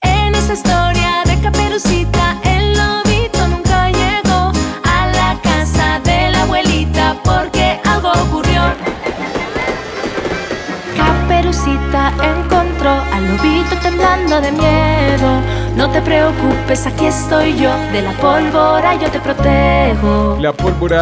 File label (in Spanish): Jingle Polvora; AMVA